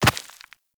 Walk Rock, Footsteps; Operator

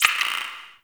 Class Lesson Next Slide; Warframe; The New War; Zariman Class Quiz

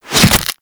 Barb Hit Default

